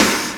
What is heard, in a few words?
Snares; Vengeance Samples; VEH2